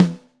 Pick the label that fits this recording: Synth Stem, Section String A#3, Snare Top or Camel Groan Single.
Snare Top